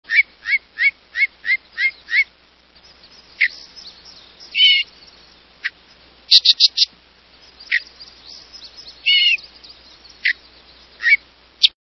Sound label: Yellow breasted Chat